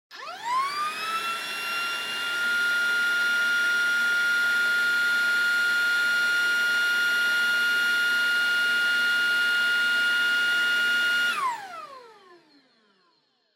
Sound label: Vacuum Cleaner Vacuum High Long